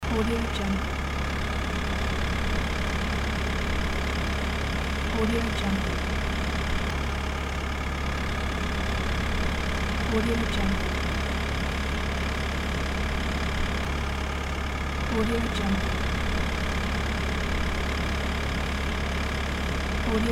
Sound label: diesel motor